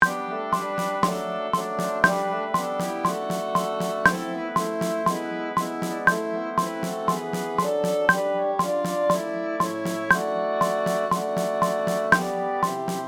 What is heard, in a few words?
allemande en tripla